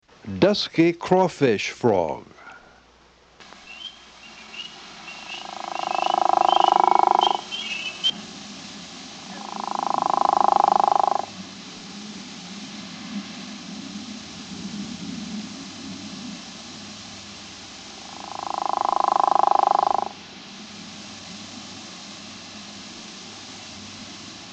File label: Dusky crawfish frog (Rana areolata sevosa); Family Ranidae - True Frogs